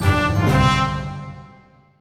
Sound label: runs